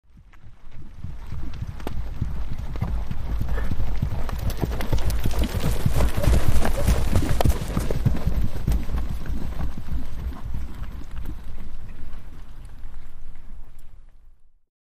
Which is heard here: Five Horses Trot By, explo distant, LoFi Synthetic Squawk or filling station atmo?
Five Horses Trot By